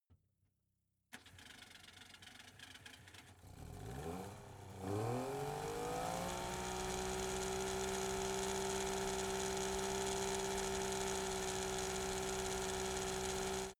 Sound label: car saab 92 startup rpm low engine